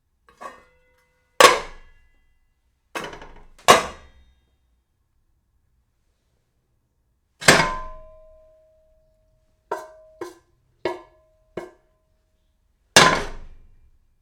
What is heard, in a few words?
pots bang